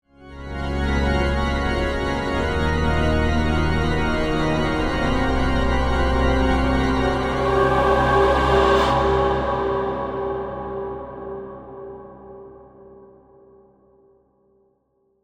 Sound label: Organ Choir, Horror, Musical, Organ, Choir, Swell, Dramatic; Scary Soundscape